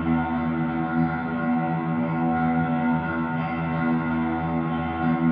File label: Gretsch Ring FX 90 E, guitar drone